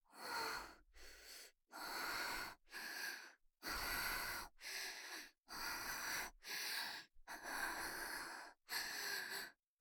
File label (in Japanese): 吐息